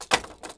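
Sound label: blaster drop creaky wood; objects; Star Wars Obi-Wan; XBOX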